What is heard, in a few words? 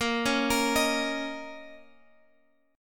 Bb Minor Double Flat 5th, Guitar, Open G; 20,18,20,20,x,x